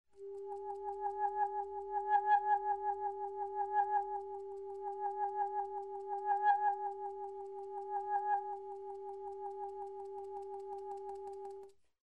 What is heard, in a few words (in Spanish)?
Bisbigliando